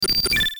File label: 8bit move